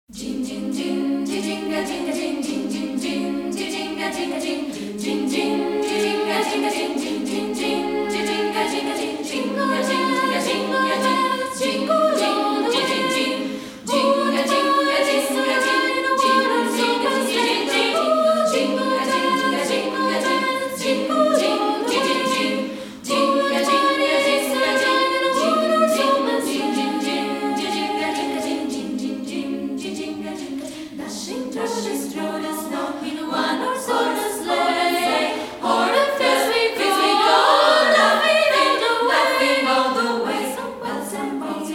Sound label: Jingle Bells, Coro; Coro Enjoy